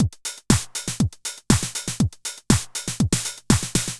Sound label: House Drum